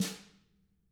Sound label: HIT, Drums; CAMCO